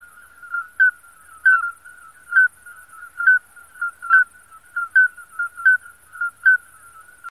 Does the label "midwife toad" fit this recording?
Yes